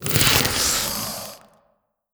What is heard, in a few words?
bed crawl out; creatures